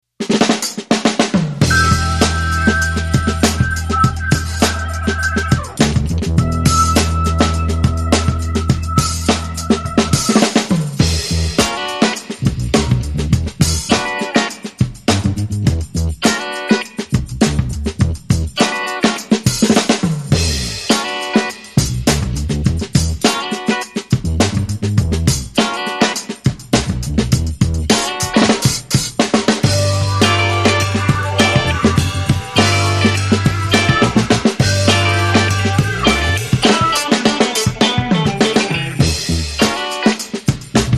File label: No Vocals No Brass Mix